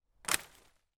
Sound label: impact tree branch small land on other branches on forest floor